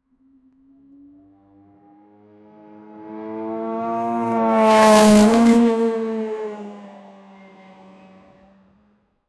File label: realistic aston martin, sport car